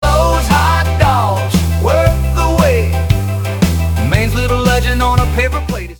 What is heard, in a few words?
sting; flo s hot dogs